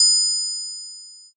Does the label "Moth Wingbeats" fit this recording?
No